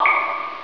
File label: drip